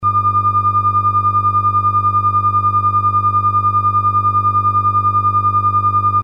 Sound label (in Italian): battimento; Elettronica